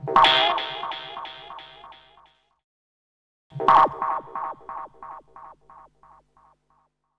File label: Spring Zapper, Musical FX; Zero-G Smoke